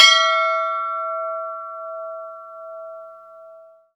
score regular; Counter Strike Model Pack; CS:GO; training

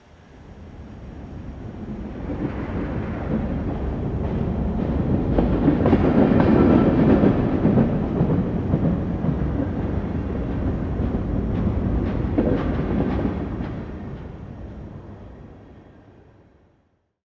train distant pass; ambient

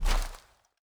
body impact soft

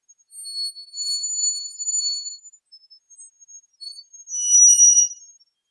equipment stress

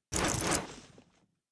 deagle deploy; weapons; Counter Strike Model Pack; CS:S

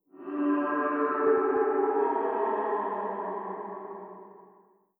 idle moan wet, zombie vox; creatures

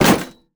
container damage; Counter Strike Model Pack; CS:GO; survival

